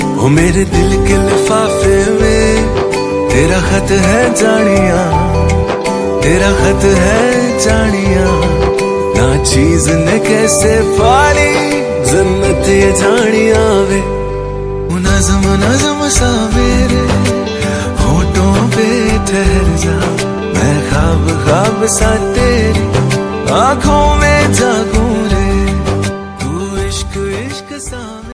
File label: love romantic ringtone, bollywood ringtones